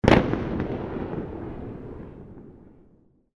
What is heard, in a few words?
explosion